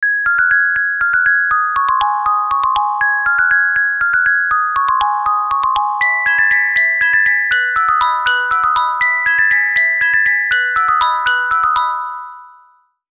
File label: Melodia, phone tone; Sony Ericsson; Xperia X1